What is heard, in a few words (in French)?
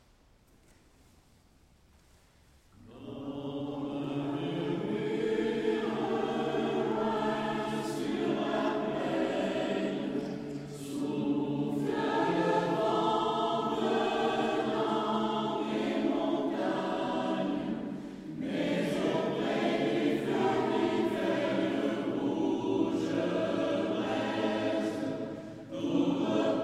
CHANT DU SOIR; Concert noel aixe 2012